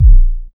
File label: 127 DRM, Fresh Disco House; USB Soundscan vol.20; AKAI